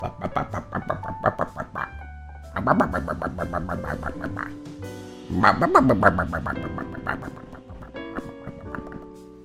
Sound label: noises